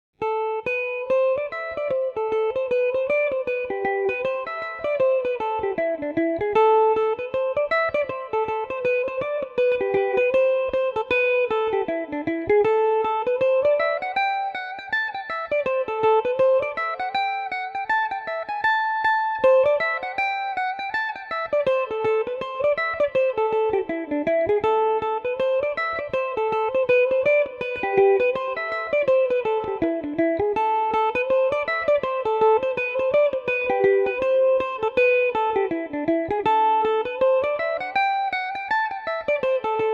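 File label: A minor, irish mandolin; molly put the kettle on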